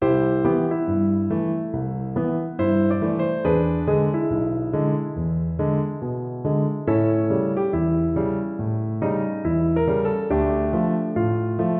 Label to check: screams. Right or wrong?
Wrong